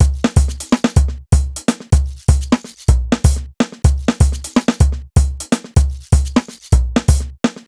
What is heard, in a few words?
top basic, 125 Beats